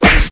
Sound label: Punch; The Crow City of Angels